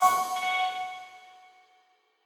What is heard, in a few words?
Quasar Clear B; Mixolumia